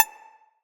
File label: Health Pulse; Tenno Shield